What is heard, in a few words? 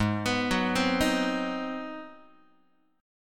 G sharp 7th Sharp 9th, Guitar; G#7#9; arpeggiated; x,4,2,4,4,2; JGuitar